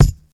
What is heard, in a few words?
lo fi kick single hit f key; kick drum samples